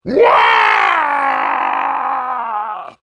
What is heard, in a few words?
scream falling; covy involuntary; elite